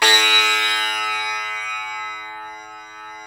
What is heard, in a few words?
SITAR, Plucks; Eth Plucks, Pluck Duo